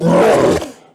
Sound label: brute hurt; trespasser